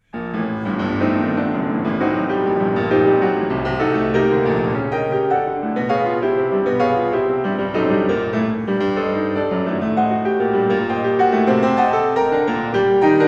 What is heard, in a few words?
Chopin Twenty-four Preludes, Op 28 - No 22 in G minor Molto agitato; Linn